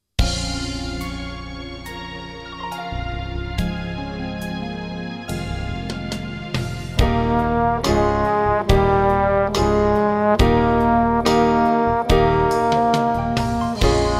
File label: Duet